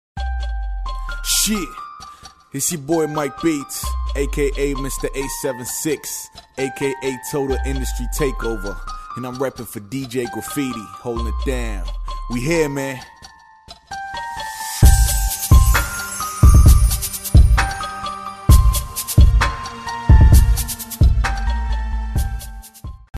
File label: beatz intro